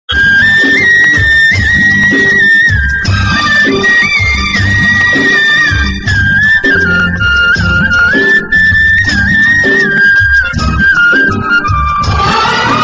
Flut; Kishan Kanhaiya

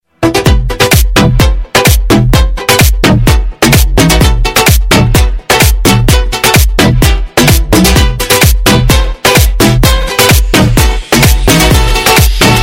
El Mariachi Remix

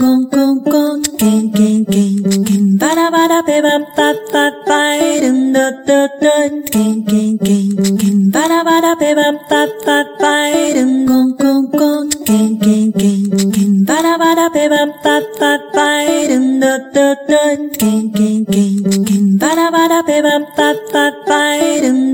Bossanova Tone

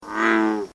Bullfrog 1 call

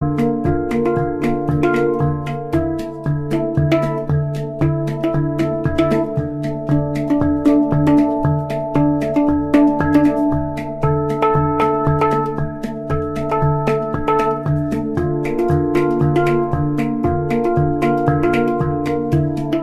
Hang drum

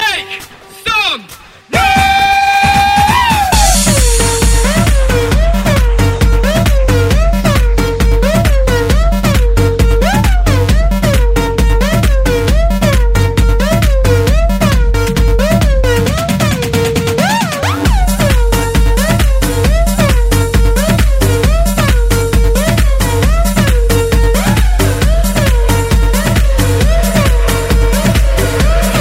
Bass Kick

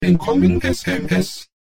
Incoming Sms; Robocop

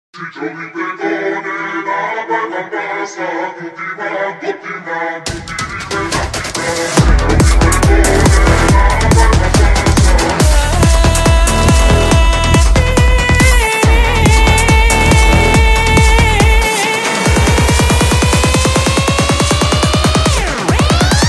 Balkan

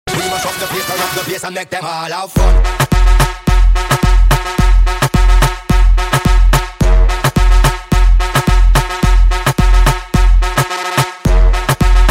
Beat Drop

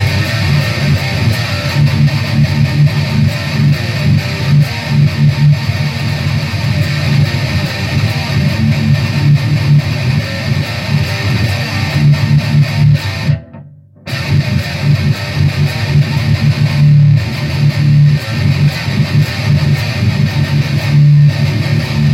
METAL

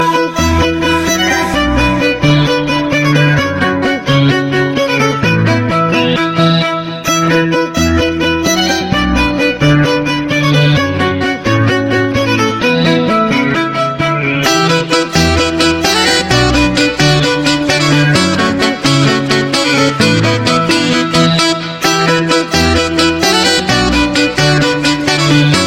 Violin

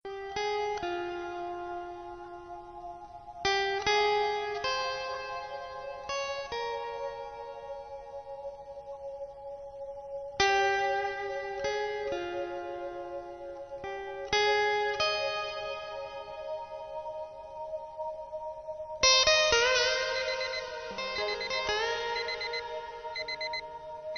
Slow Guitar

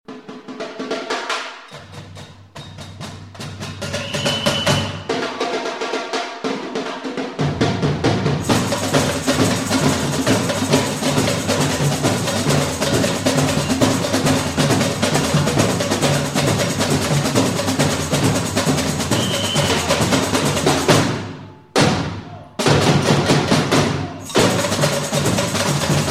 Karnaval Brasil

